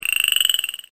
frogs

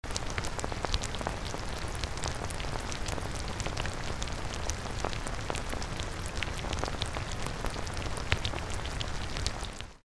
rain

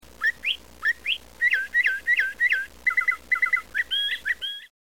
mockingbird

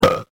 burp

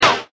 laser

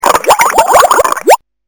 bubble

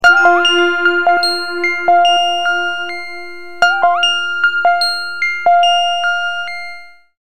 GONGS

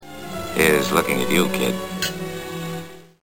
kid

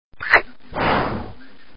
Blow Kiss

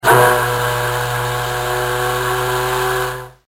factory whistle

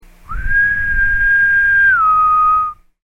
whistle

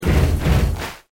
stomp stomp clap

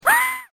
scream

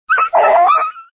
dog whine

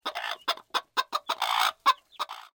ostrich

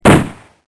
UZI

